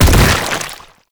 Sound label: Bodyfall Light; Enemies; Warframe; Proto Infested